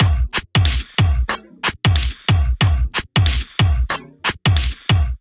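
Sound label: Demon Kit 92 bpm, Vocal Percussion; Ableton; Factory Packs; Voice Box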